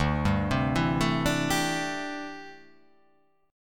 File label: D 9th Suspended 4th D down; arpeggiated; JGuitar